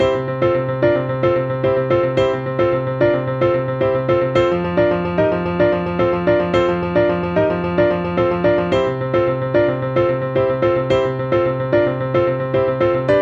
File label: Paradiddle Exercise